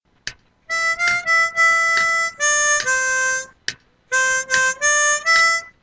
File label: Solo